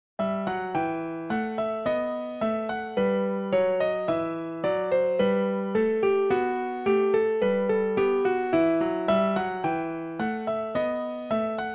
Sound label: bourree in e minor piano; bach